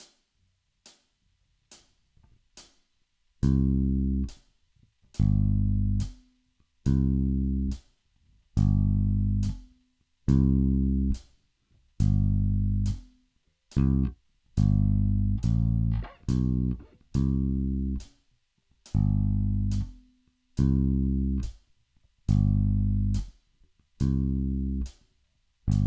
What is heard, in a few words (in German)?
karneval oder country; baixo